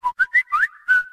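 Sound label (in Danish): whatsapp fløjte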